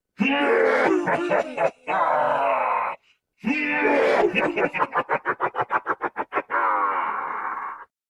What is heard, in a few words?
mwahahahaha